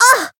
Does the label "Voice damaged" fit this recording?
Yes